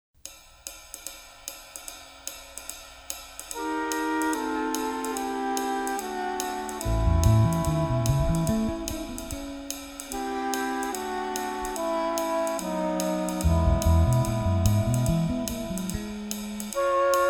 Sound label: Jazz